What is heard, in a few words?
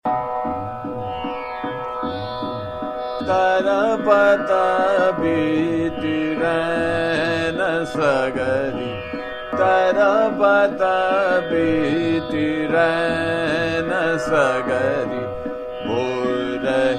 Chota Khyal, Trital; Basant Mukhari; Tarpat Beeti Rain Sagri